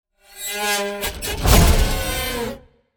Metal wires transitional impact

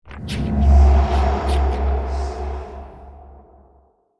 Horror accent eerie hiss

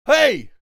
Male shout for attention; scream

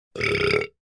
Human burping short and deep

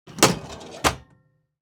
Metal drawer opening

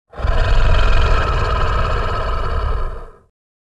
Werewolf deep growling

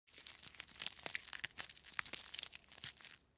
Body part blood and gore

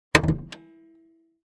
Car exterior foley hood opening

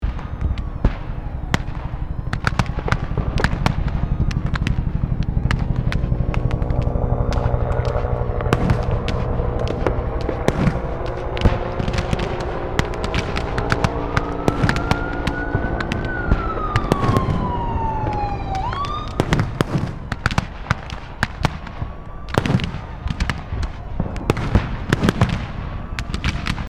Citywide evacuation police cars and helicopters

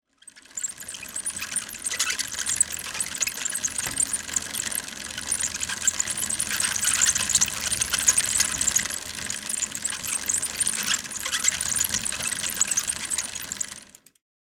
Old squeaky rusty bike pedaling; bicycle

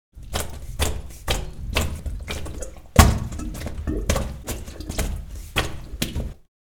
Plumber working water gurgling

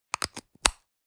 Speaker connector adapter plug in; headphones and speakers

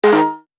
Digital system error

